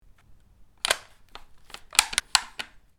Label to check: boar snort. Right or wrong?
Wrong